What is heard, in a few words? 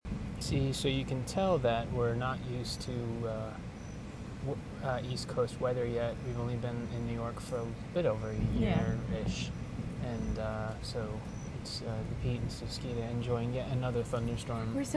thunderstorm wooshes by manhattan